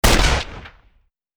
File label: mauser bolt action rifle single shot